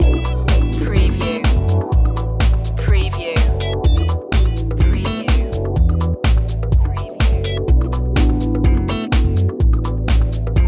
calypso loop, chill

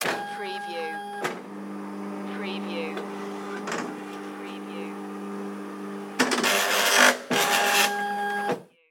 Xerox Machine Copy; sound effects bible

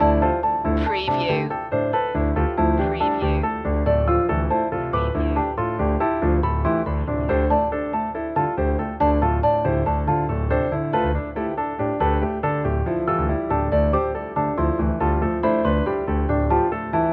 mind matrix loop

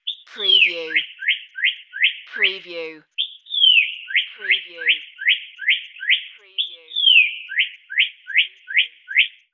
Mocking Bird Call, bird

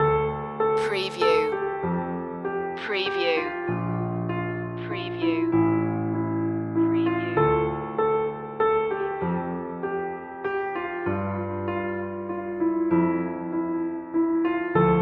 acid rain piano

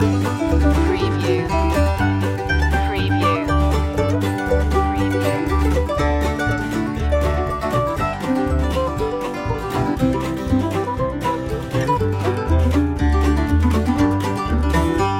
hoedown loop

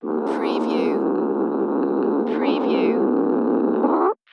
Cat Growl

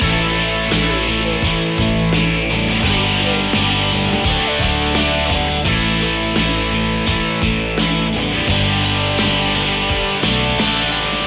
great feeling loop

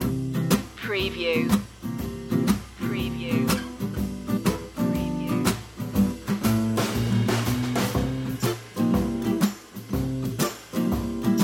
star spangled blues loop

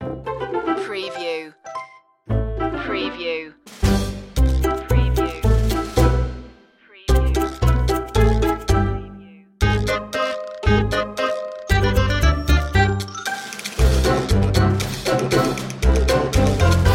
cartoon kit hide and seek

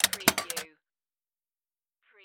Pinball sound, multiple bumpers or switches

